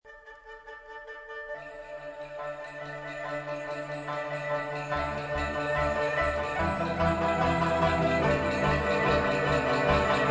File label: Banhu